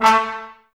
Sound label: brass, Tbs Mute